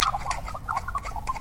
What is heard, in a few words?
Tape Jumping